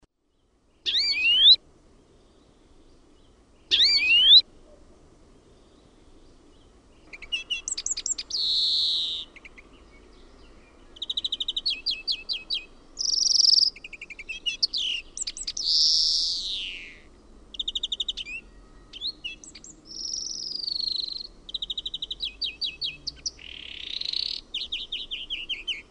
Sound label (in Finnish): VIHERPEIPPO, LINTUAANITYKSET; OMAT AANITYKSET